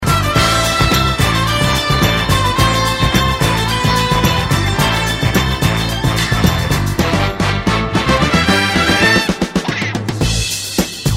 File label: Horns